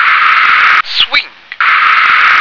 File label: swing